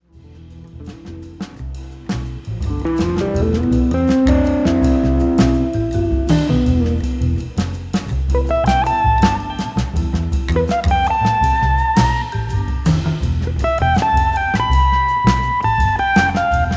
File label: Guitar solo